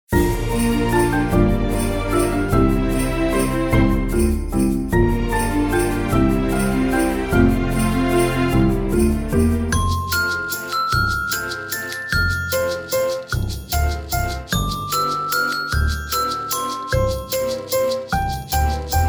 CHIME BARS with ACCOMPANIMENT; Let's Play Our Instruments

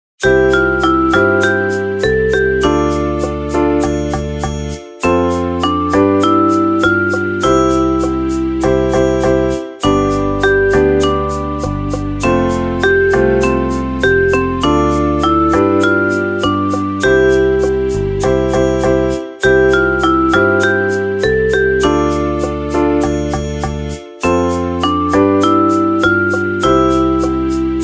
Full Ensemble; Lotus Root Chicken Patty

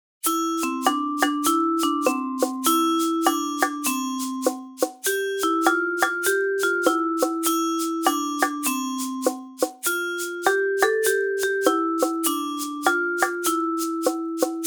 Percussion Ensemble

